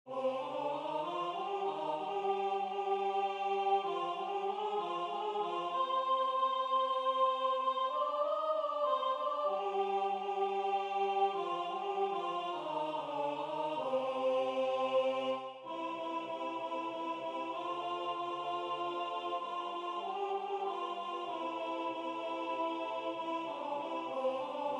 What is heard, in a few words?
alto